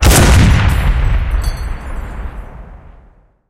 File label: cannon 85mm ZiS C53 shot